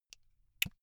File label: buckle plastic open